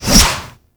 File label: Whip Whoosh, Weapons